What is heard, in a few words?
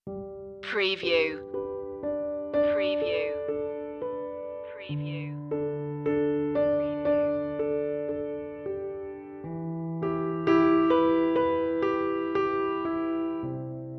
piano lullabye stinger